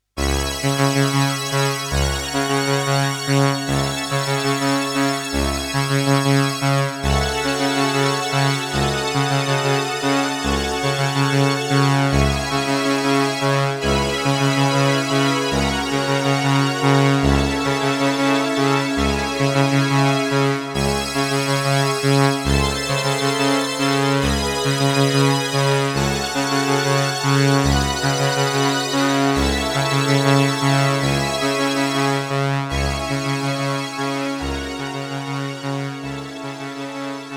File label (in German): Cellobass und Tutti SS 30